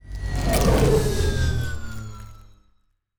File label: pro ship colony move; biogenesis